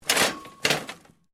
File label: METAL CRASH SMALL; Metal Sound Effects; Metal (Sound Effects:Materials)